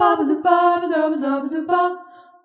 Jazzy Harmony

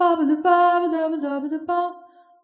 Jazzy Solo

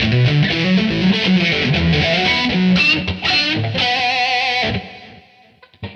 lead 122

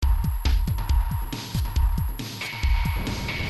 Trippy Techno DnB 138

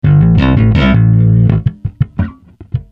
Bass Slap A 085

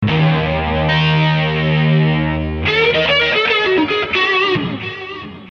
080-100 solo Wah

